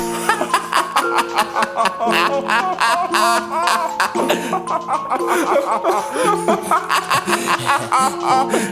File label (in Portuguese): rap dos coringas circo dos horrores